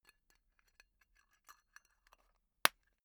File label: tobacco tin close unsteady long